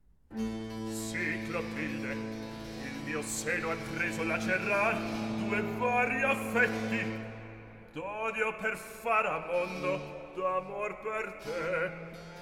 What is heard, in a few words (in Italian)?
Recitativo; Si, Clotilde; Handel Masterworks; Act I